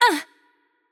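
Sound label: Hurt Light, Japanese; VO; bloom minigame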